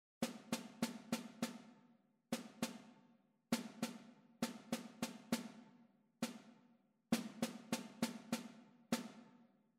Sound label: rhythmic error detection